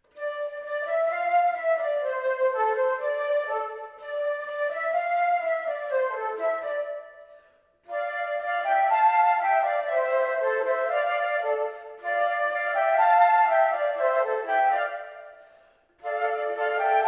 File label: lobsters flute